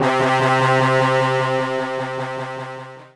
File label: Shrinkbass